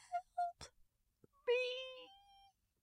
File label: take rose